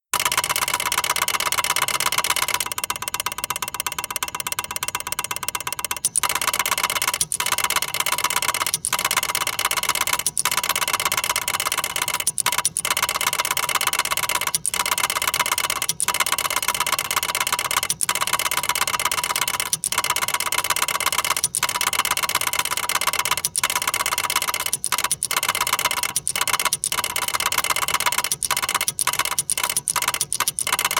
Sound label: Timbre Remix Of Ticker Tape Machine